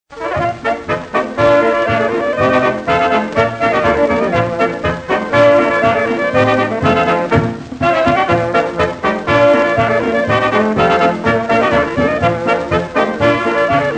RED BEER POLKA

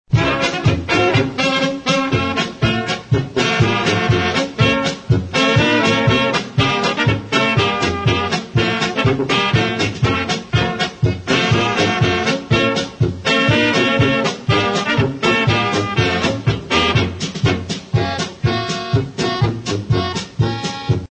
polka

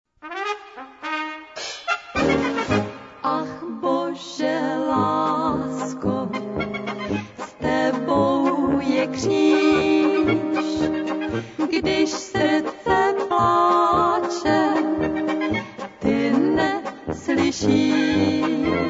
POLKA MEDLEY

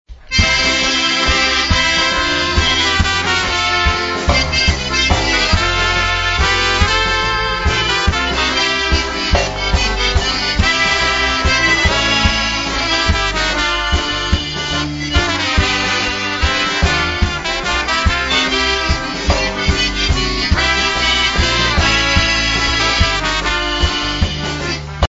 waltz medley

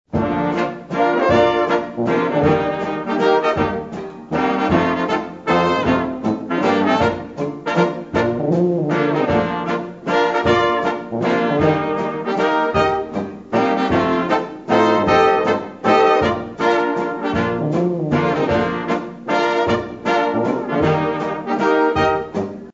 waltz

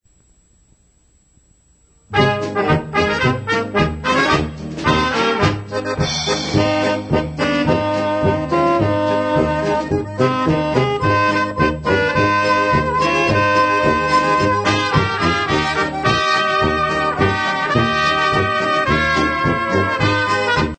HAPPY BAND POLKA

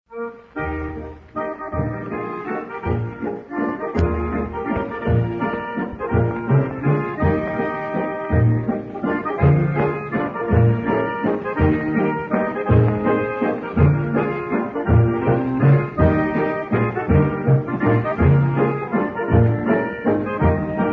waltz medley

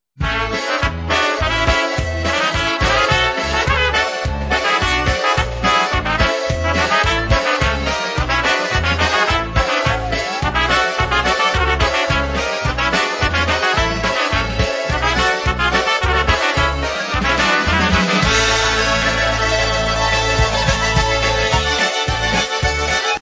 krakowiak

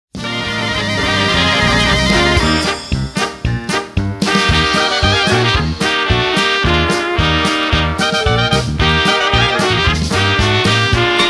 Polka

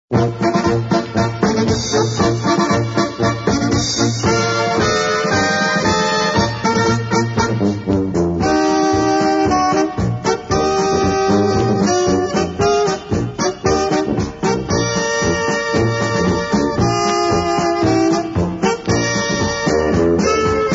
polka band

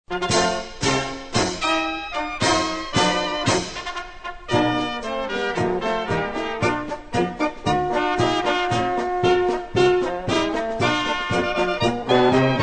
POLKA

